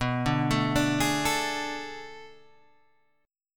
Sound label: B Minor 13th B down Guitar Open G